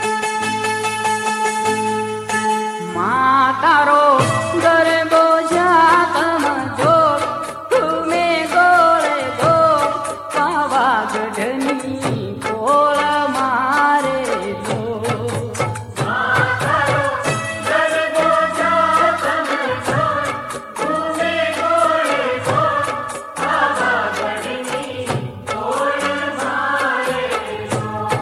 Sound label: garbo gujarati